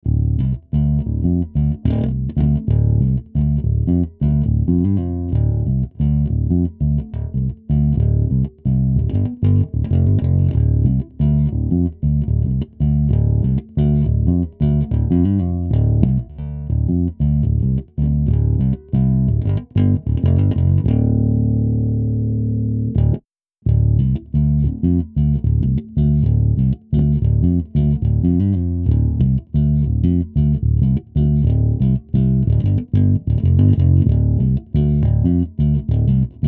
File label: clean; fat bastard